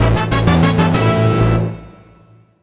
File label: level up